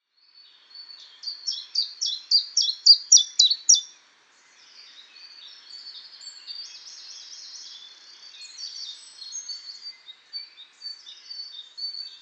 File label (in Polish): pierwiosnek tjiftjaf snelle zang; peter boesman